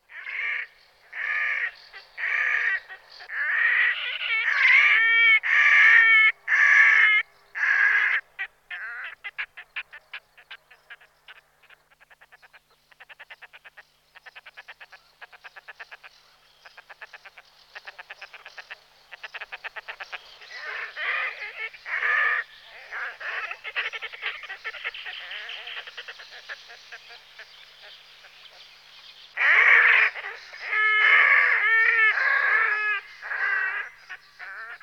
Potapka rudokrka Podiceps griseigena

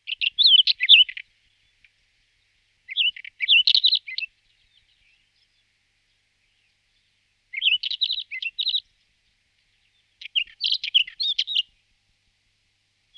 Belorit cerny Oenanthe leucura

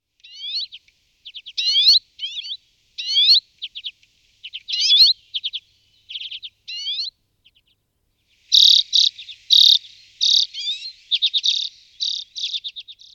Cecetka zimni Carduelis flammea

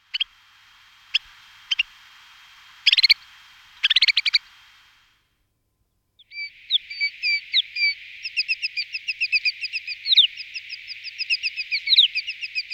Penkavak snezny Montifringilla nivalis

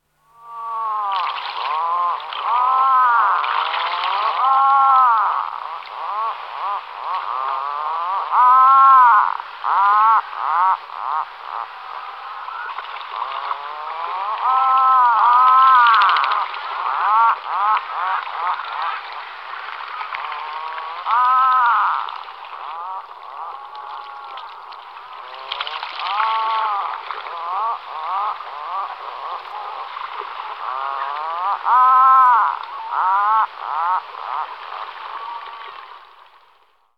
Papuchalk severni Fratercula arctica

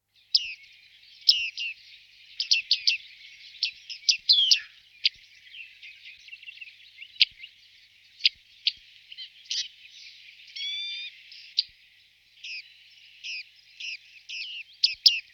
Sykorice vousata Panurus biarmicus

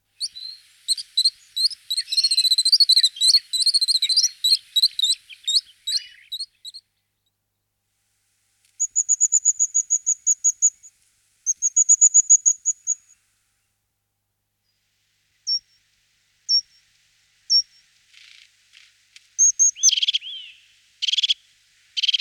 Sykora parukarka Parus cristatus

